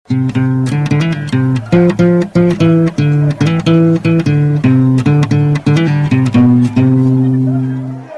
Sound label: bass